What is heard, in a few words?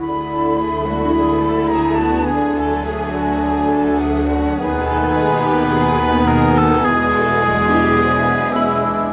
Cinematic Orchestra